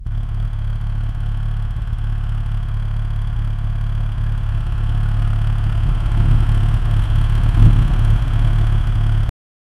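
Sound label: turbocharged engine exhaust; automotive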